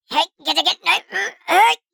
Minion effort grunts voice minions; fantasy friends

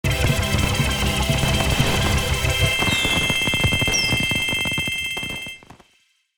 Slot machine casino golden jackpot win

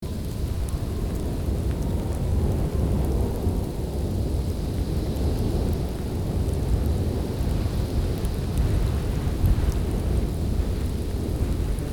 Icy snowstorm wind

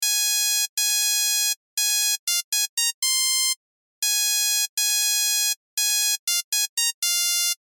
Old mobile phone ringtone call ring loop

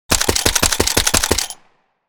Heavy machine gun automatic fire short; guns

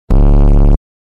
Bass boost impact short; Bass Boosted Sound Effects

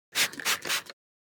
Windex bottle cleaning spraying many times

